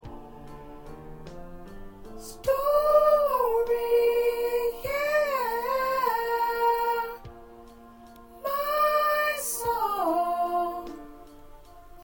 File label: Choir Soprano; gospel songs; part preview; practice tracks